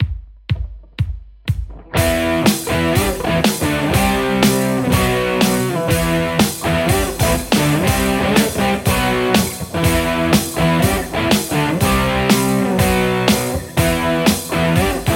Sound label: lespaul metal; acme; metalc15